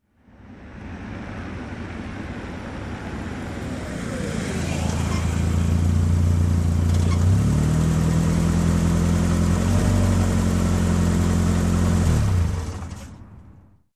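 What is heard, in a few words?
Car drove up and stopped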